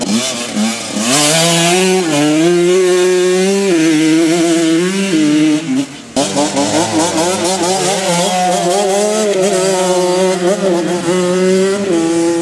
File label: motorbike racing, street traffic; best service Blue Box